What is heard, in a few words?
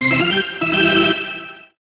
alarm